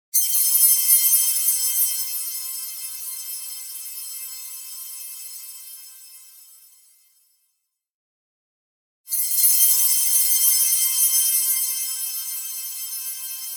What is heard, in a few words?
SHIMMER Crystal Waves; boom library; Anime Essentials; DSGNMisc